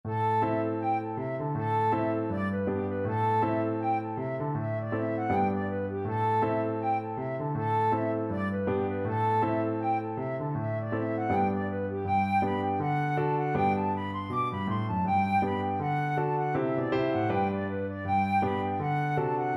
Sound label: flute